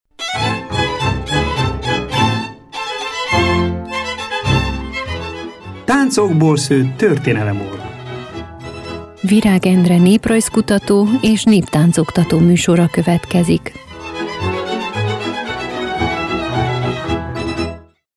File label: Jingle; Tancokbol szott tortenelemora